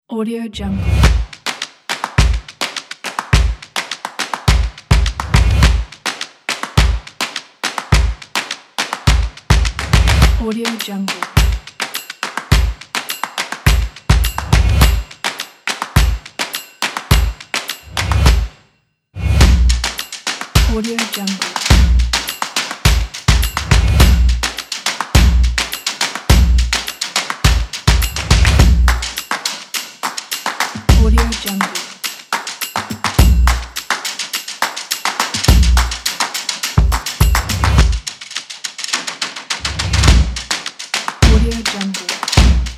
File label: energetic claps sticks